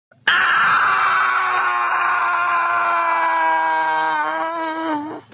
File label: Man Screams His Death Into The Void